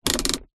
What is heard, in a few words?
transport car hand brake lever pull up apply internal toyota corolla